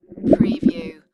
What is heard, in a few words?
Stomach Growl Gurgle